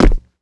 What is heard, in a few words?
land rubber, footsteps